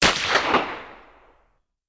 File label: GUN, crime